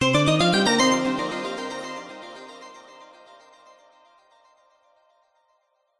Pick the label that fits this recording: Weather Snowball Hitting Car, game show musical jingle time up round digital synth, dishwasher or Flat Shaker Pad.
game show musical jingle time up round digital synth